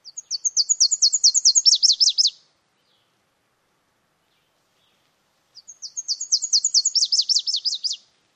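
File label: songs, bird; CA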